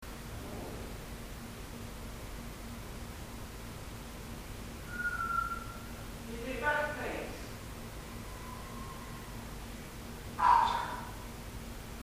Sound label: whistle back